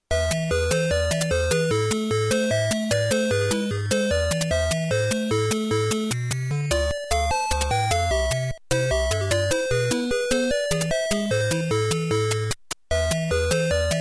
zvuk tetrisa na konsoli

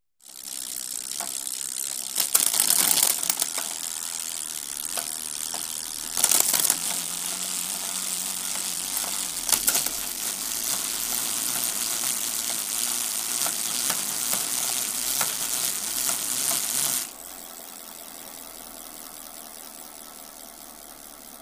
zvuk ezdy na velosipede

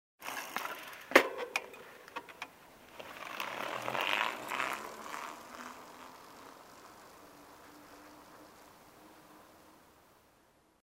zvuk startujuschego velosipeda

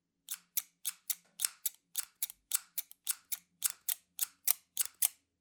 zvuk nozhnic dlja bumagi

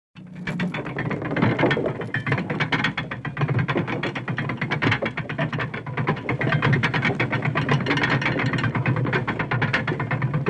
shesterenki podemnogo mosta

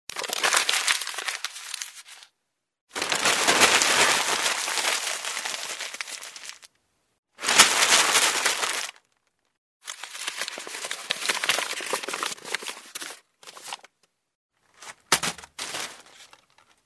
sminanie bumagi

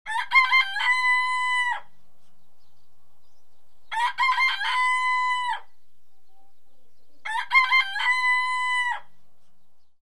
крик петуха

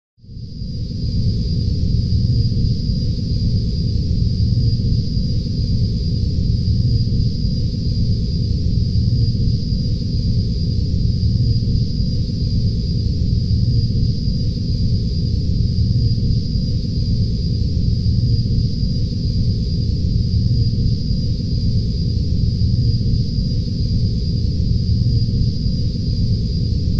zvuk kosmicheskogo korablja

